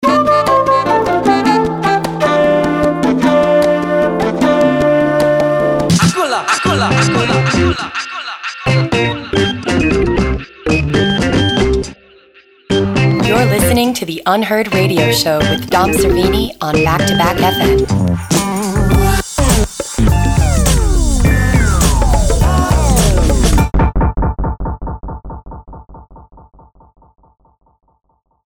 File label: Jingle